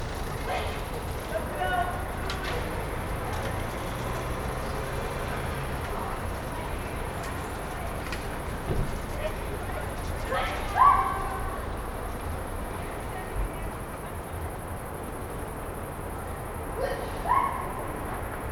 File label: People City Summer Noise